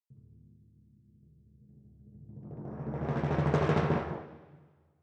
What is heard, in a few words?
Timp Close